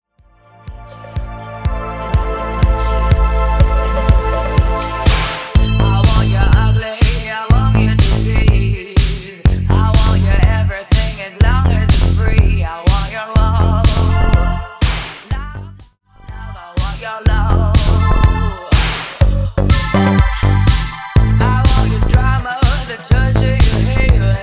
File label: House 123bpm